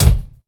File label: kick drum sound g sharp key